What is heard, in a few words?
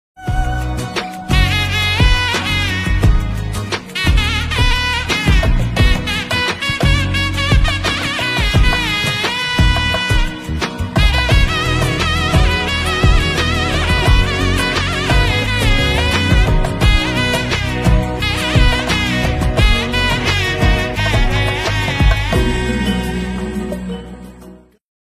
new bgm ringtone; hi nanna